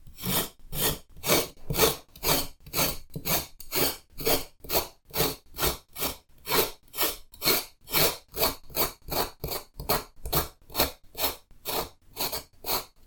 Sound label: shaving burdock; cooking